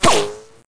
gun hit

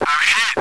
tank destroyed

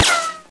gun hit

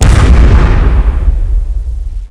missile hit

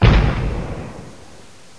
cannon hit

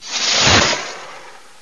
missile fire

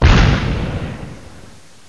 cannon hit

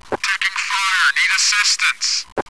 tank health

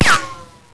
gun hit

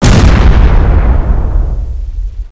missile hit

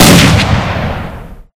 cannon fire

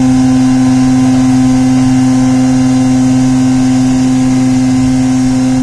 turret spin

